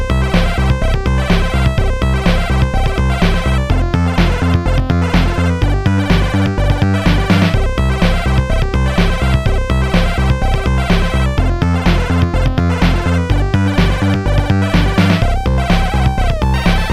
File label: death song, Chiptunes